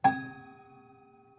Prepared Piano

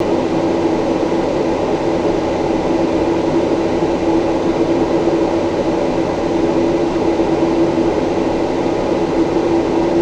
Bathroom Extractor, Extractor Fan; Extractor Fans

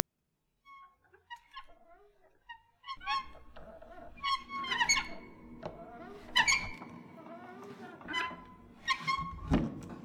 Squeaks Thumps, Piano Destruction

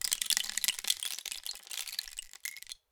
Rain Stick, Percussion Samples

